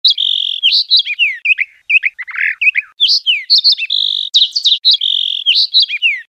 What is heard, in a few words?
Bird